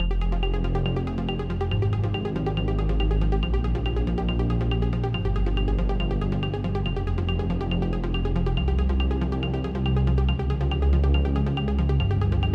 arp

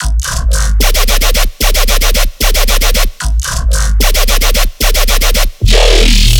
virtual riddim loop C min

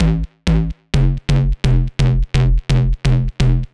Bass Tube; hera

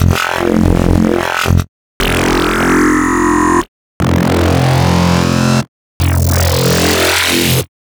designer wavetables

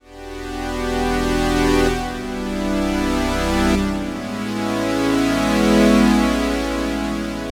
Pad Juno; hera

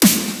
Intro Snare; spectrum

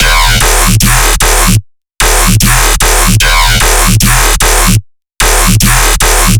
Basses 150 D; knights; Gawain; Energizer

